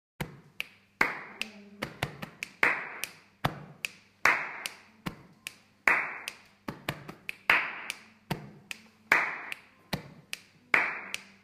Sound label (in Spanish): PERCUSIÓN CORPORAL